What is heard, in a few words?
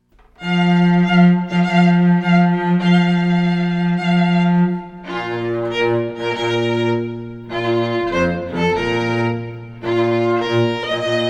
Bridal March Duo, string quartet; Wagner